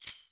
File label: Tambourine; Zero-G; Nu Disco